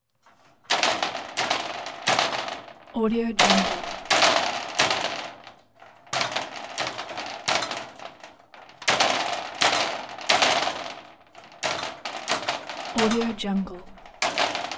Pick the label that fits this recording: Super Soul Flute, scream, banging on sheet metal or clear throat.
banging on sheet metal